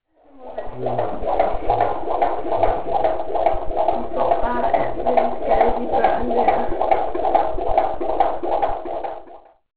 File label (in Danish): hjerteslag